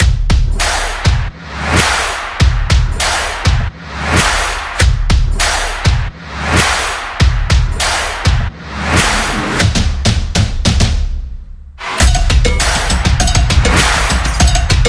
Stomp; stomp and clap

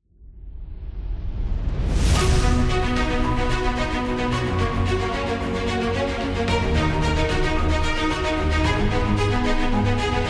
Epic Award; epic music vol 4

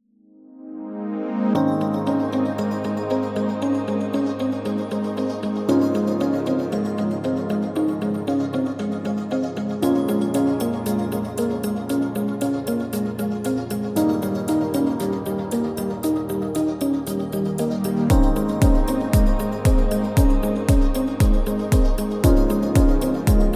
Podcast Background Music; positive vibe

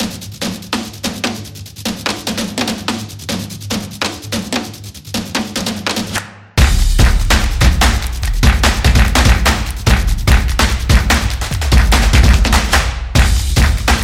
The Stomps

